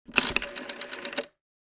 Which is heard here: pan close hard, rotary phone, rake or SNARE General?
rotary phone